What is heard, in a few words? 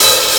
HH OPEN; Big Beat Samples & More; AKAI